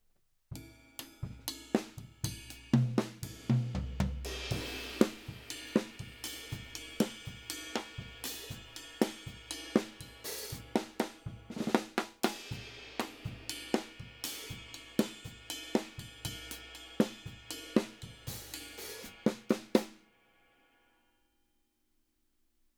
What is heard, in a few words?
Drums